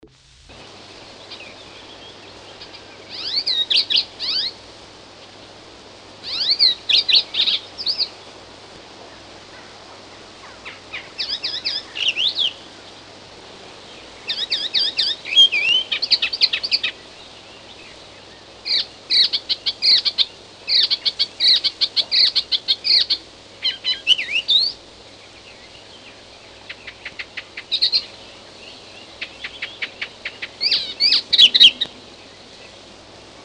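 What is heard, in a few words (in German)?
Gelbspötter; Vogel; hoerstation vogel wg platte 1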